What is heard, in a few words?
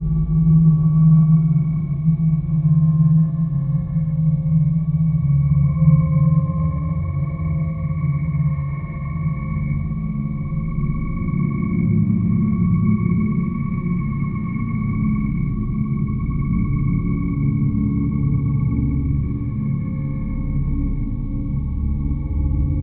sound design drone dark; sound effects one